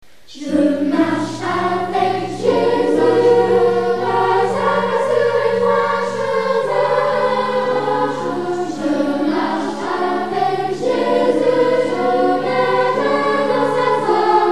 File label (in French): chant je marche avec jesus